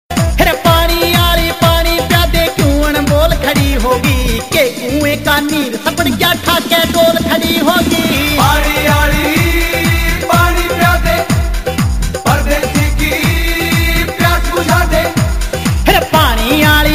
haryanvi

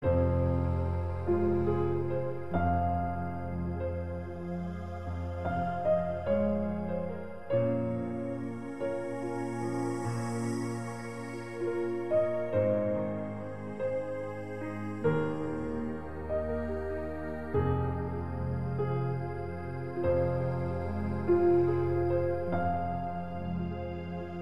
piano cool; dance

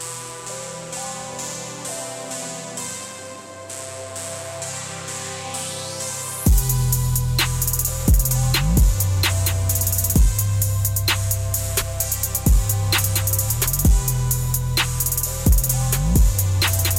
Trippy Hip Hop

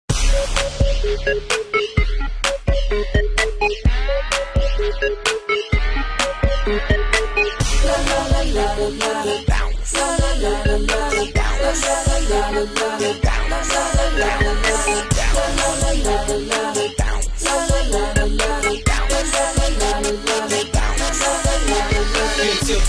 beats

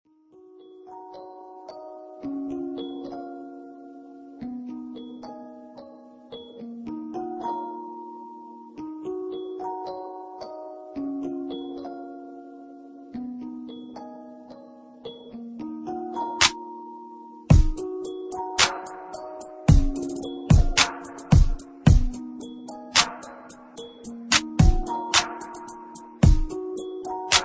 timeless love rnb hip hop beat

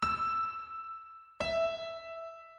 Key Change

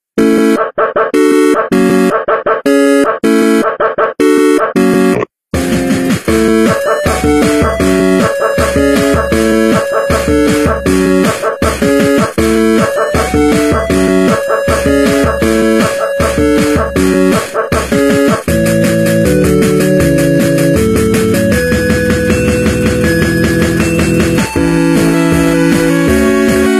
8 Bit, ringtone

